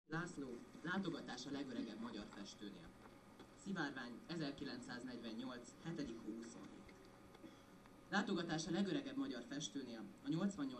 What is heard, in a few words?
digitar